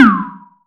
Perc

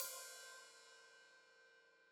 Hat Open, Hi-Hat; Deeply Sampled Drums

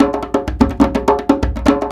Percussion Loops, Bongo & Conga; Houseworx

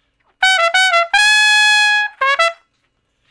Trumpet Shuffle (Db); Crimson Blue and Fabulous Horncraft 4 RnB; Horncraft for R&B; Solos

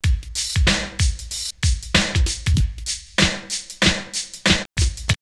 Groin Groove 94, drumloops OldSchool; X-Static Goldmine

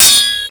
sword hit; Total Control - Action Cutz

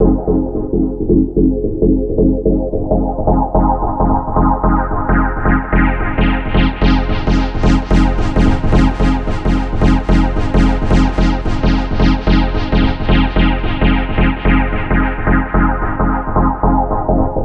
Progressive Synth, Trance

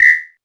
technox perc; Quasimidi Technox

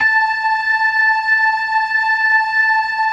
LO MANUAL VIB, Organ

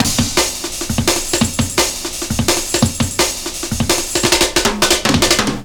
Swift Beat, Drum & Bass, Beats & Breaks

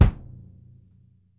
ROOM, Drumworks; Sampleheads; New York City Drumworks VOL-1

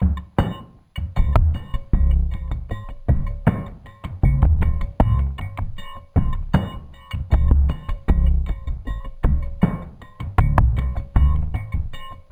ICE BRUSH REMIX, Liquid Grooves; Spectrasonics; Liquid Grooves 1 & 2

